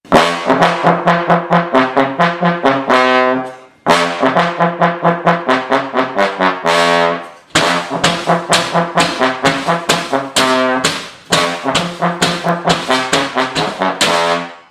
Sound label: Oven Kid Trumpet